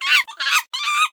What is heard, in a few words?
Monkey Play Underlay